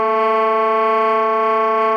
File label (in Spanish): Tremolos